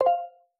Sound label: join; TTCom Soundpacks; Apple